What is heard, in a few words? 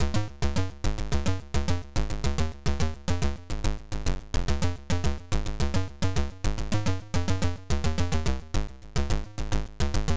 bass theme